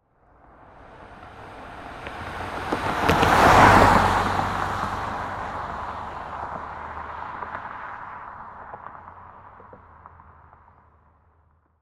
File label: Car Pass By, Transportation, Automobile, Car, Pass, By, Car, Stereo; SoundEffects-BlastWave